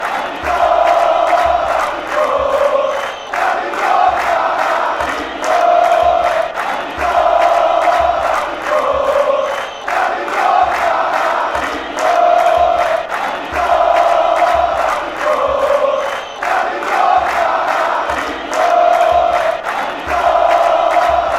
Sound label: paraguay chant